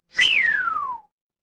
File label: cartoon slide whistle